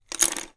office paintbrushes artists x5 place down on surface